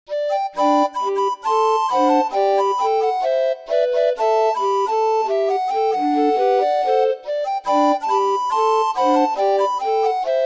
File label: Sunny Tennesse, orgue barbarie